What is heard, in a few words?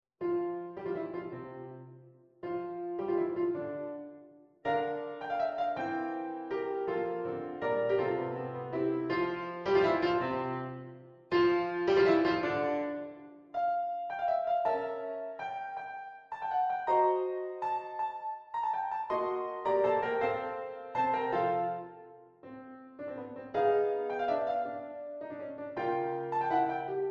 piano arrangement beethoven op. 18 no 1; Exercise 5.0 – Sonata Form